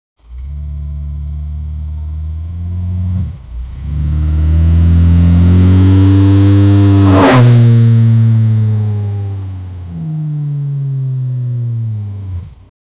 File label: muscle car engine sound, engine start